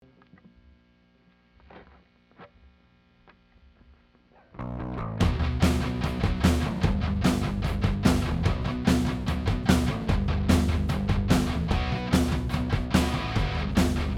Drum Stems

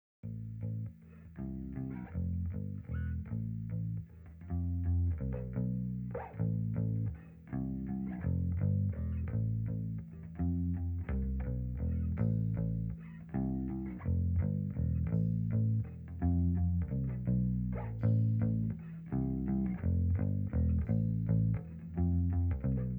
M201 Bass

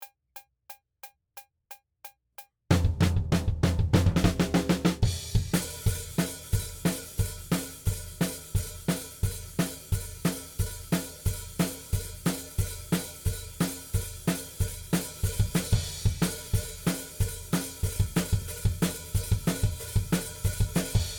Drums ONLY; Pre Pro

